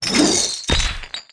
Ball And Chain Retract

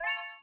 Item Menu Notice

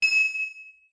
Rupee Land

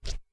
Boot Lift

